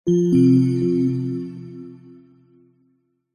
multimedia game sound relaxing game soft twinkling chimes notification